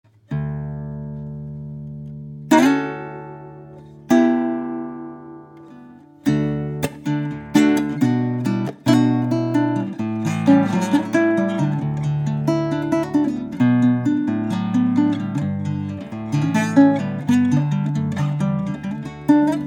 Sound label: Guitar